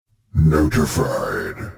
Notified

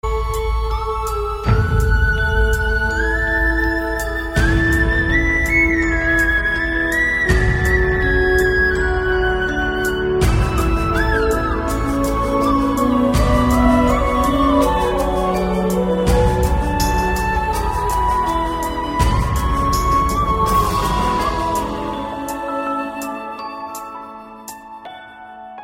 New Flute Ring

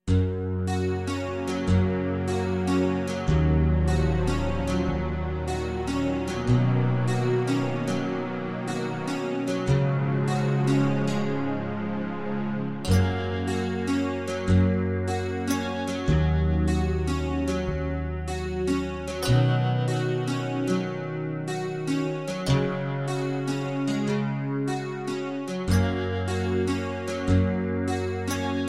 Hero Music

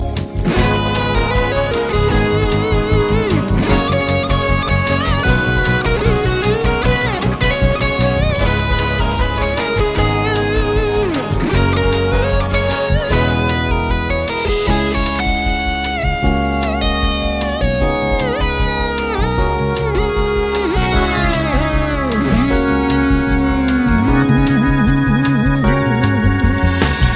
Ringtone Sms

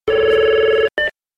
Executone

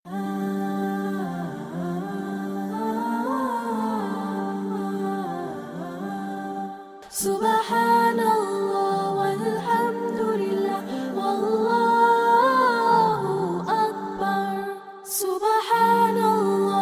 Soft Islamic Tone

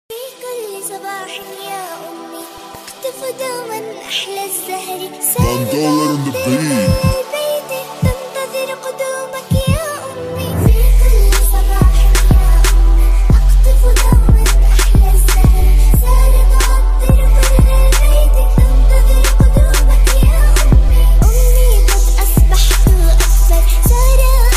Arabic Trap

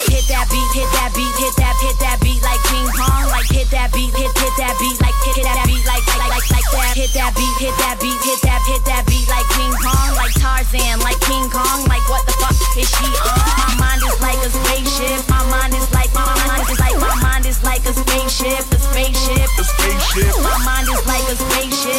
Freestyle

